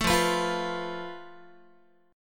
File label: F Major Flat 5th Guitar, chord; FMb5; 12,13,14,15,15,x; 4A,4E,5A,5D,5G#,6C#; strummed